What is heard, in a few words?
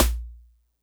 XyloSnare DX100 As0